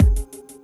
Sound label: Big Kick, Drums; BB 2HTC Samples Vol 4